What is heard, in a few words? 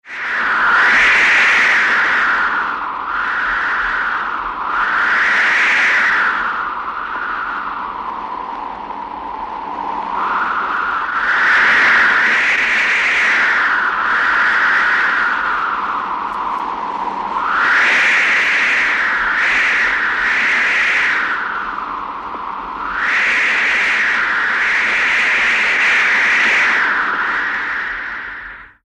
Old Eerie Wind, eerie gusts